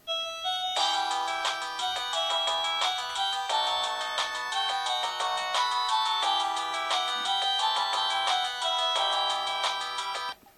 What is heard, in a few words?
samsung sghe700 ringtone